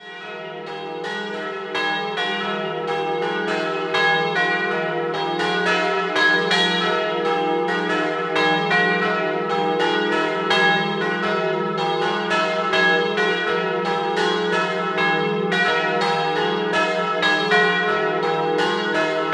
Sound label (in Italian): Doppio, campane; Barazzetto